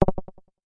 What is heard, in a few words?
interface button, clicks